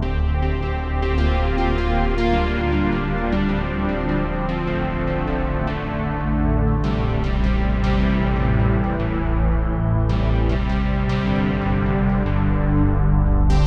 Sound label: Ancient Game Menu; fantasy